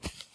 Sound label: brick hit soft